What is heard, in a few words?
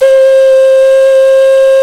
D OCRNA, CLAYFLUTE